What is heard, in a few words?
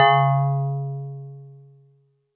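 StarPiano DX100 C#0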